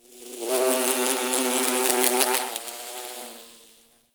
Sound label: Insect Bumble Bee; Warframe; Eidolon Plains; Ambience